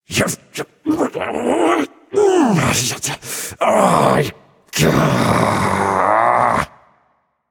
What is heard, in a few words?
grumble; Smite; baronsamedi